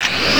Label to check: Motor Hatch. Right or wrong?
Right